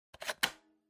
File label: Floppy Disk Drive Insert